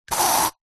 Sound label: household deodorant spray